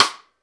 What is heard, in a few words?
STICK, Drum 1 Dry; East Collexion